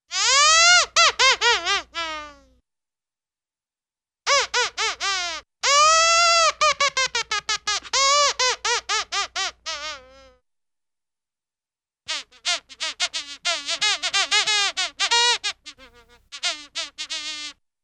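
Fly Laughs with wing Buzz Imitating Bugle Reveille, Cartoon; Hollywood Edge; Cartoon Trax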